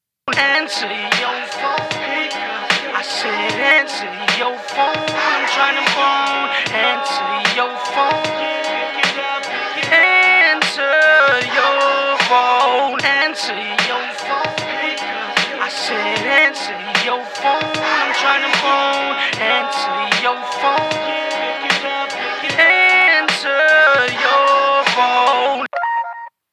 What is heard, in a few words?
ringtone